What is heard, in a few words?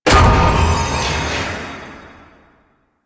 Beam Start; Grineer Forest; Ballas; Warframe; Veilbreaker; Grineer Forest Thumper; Kahl